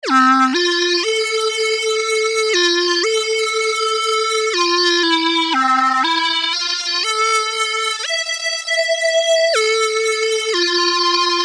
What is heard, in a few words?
scale